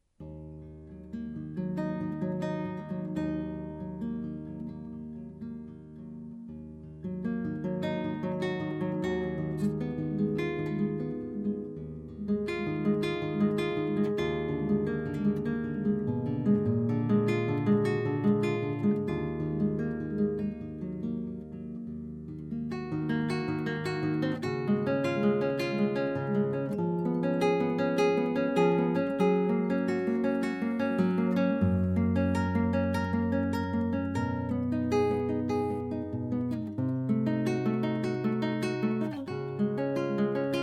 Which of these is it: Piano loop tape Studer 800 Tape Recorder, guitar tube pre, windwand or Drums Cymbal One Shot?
guitar tube pre